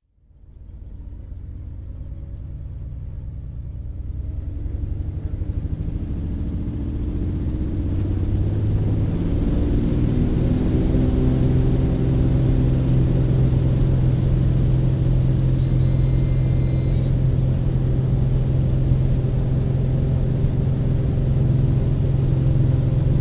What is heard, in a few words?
Propeller Aircraft flight extending flaps from cockpit